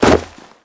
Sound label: snow land; Golden Crayon Sounds